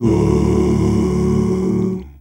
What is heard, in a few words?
Male Voice, African Voices